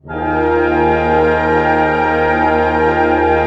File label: PENTA D# PP, orch penta; Sound On Sound - Peter Siedleczek's Orchestra